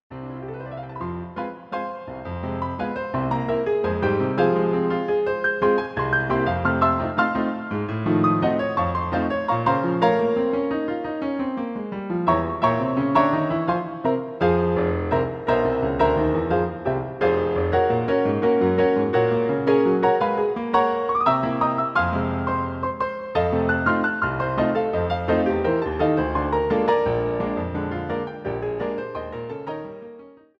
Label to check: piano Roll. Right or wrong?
Right